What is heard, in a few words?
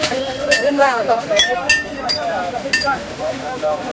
busy indian street food s